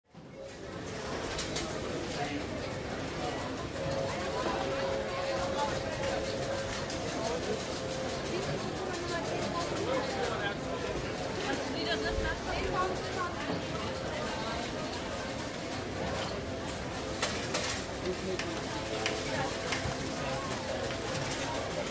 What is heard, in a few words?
Casino Ambience; Slot Machines And Heavy Walla